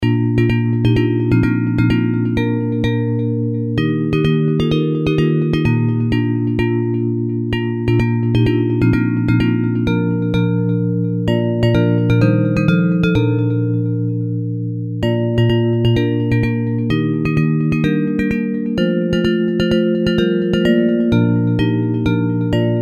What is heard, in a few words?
Bells